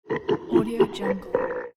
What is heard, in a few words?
evil halloween laugh